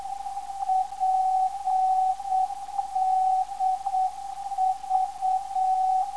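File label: 285kHz, non-directional beacon; PDV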